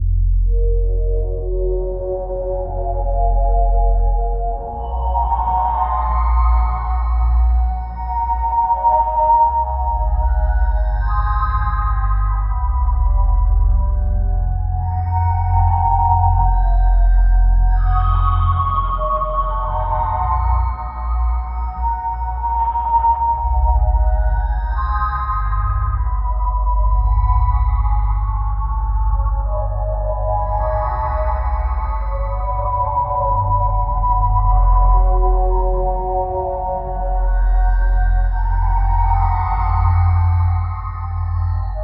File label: horror game ambience musi, loop